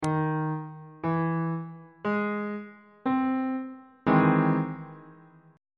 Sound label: d double sharp augmented major 7th 3rd inversion on bass clef